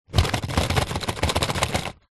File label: household medicine lozenge pack shake; strepsils